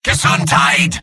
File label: medic battlecry; mvm